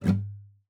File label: GUITAR FX; Xsample VOL-2